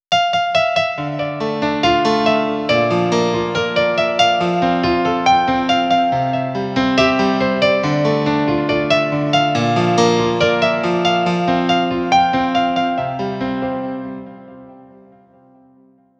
Piano, 140 BPM; Vengeance Samples; Song Kits; Take Me Away

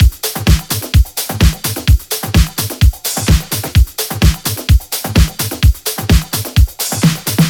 128BPM Latin Kit; Latin E Kit

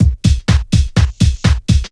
drum loop 125, heavyhouse; PS-28 Vocal House Samples; drumloops

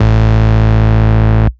Bass Root A

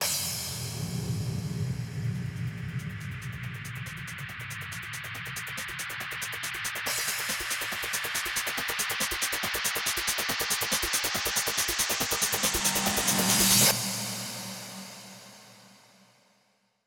140BPM FX 8 Bars; Vengeance Samples; Snares&FX Pairs

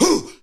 Vocal Oneshots; Vengeance Samples; Vocals

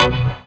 Synth Shot; Vengeance Samples

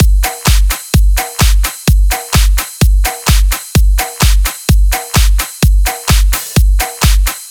128BPM Drums; Vengeance Samples; Basket Kit

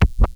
Scratch In, vinyl record

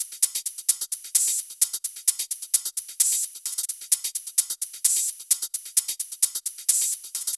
130BPM Kit; VFH1 130BPM Tibet Kit

